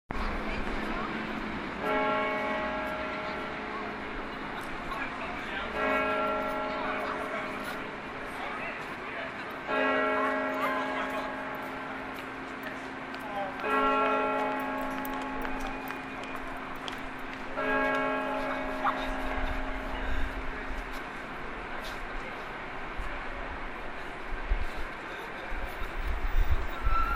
bells of st paul s cathedral

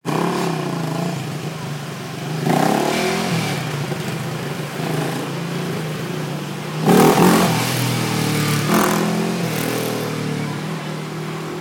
motorbike

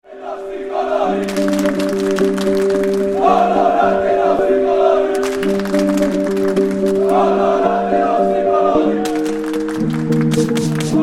Loud boys, crowd noise

